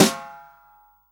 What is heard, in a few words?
snare drum A, SWING SNARE CHROMATIC, REAL DRUMS